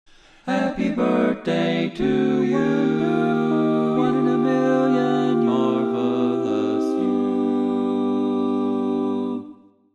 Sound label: Tenor, barbershop